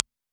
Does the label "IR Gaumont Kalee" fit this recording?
Yes